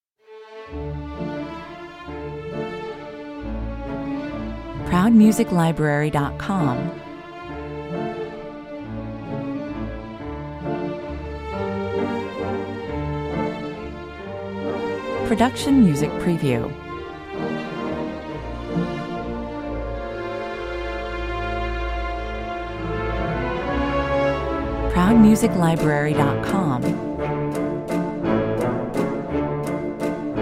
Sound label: an evening waltz, no percussion